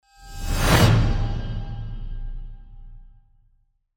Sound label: Swish